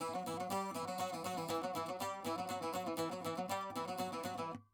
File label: saz